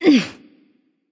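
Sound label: grunt attack, female; smite; voicepack; hera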